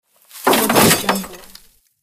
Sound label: garbage bag throw plastic bin